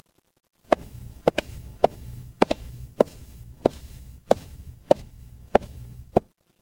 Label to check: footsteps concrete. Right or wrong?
Right